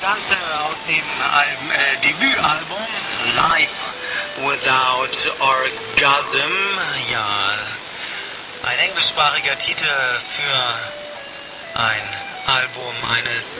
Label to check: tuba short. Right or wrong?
Wrong